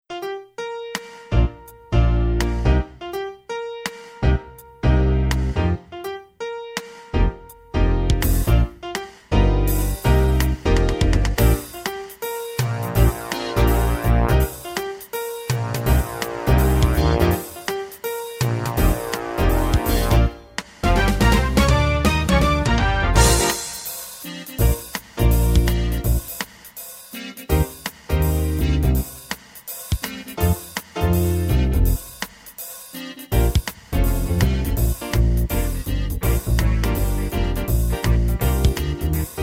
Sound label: Jingle Bells